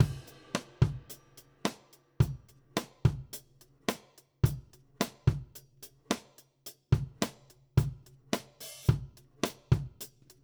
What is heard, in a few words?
Country Drums Verse; Verse 1; Digidesign; Pro Tools Essential Loops